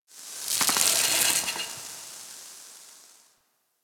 Ice Sizzle Amb

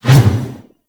Mace Whoosh Slow; Warframe

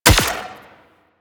Turret Fire; Gyro Liset; Liset Powers; Vehicles

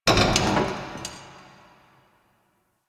Car Creak; Ambience; Props; Warframe; NineteenNinetyNine

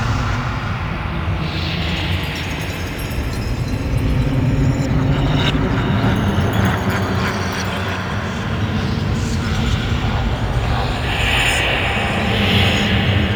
Fortress Chamber Amb

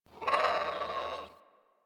Bird Of Prey Vocal Perched Ground C; Warframe

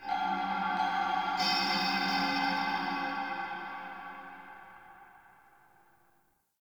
Root Cave Magical Chimes; Ambience; One Offs; Neathuns Root Cave

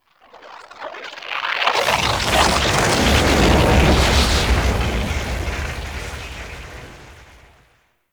Intro Laser; Warframe; Ambience; Hub; Tenno Junction Relay

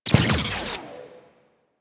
Mining Laser Missed Deactivate; Weapons; Warframe; Eidolon Mining Lasers

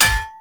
Hammer Impact Parry; Weapon Impacts; Player; Combat

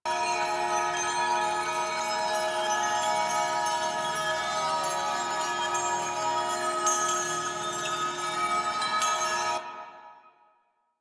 Drone Loop; Warframe; The New War; Narmer; Grineer

